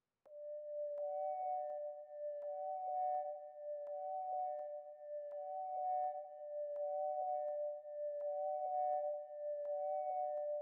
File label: 166 BPM B Min Background Lead; Melodies; Loop Stems; Cymatics; 2022 Melody Mystery Expansion; Midnight In Seoul